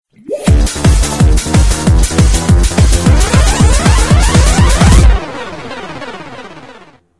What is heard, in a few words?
drowning; opensurge